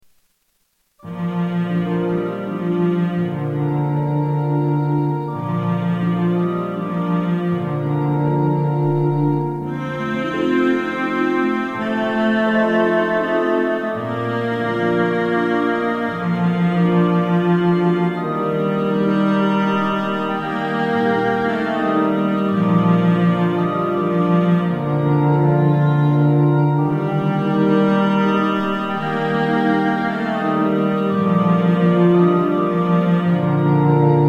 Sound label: Silent Night cello G; Christmas